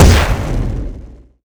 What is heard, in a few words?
Aircrafts F-15 Afterburn Stage; DCS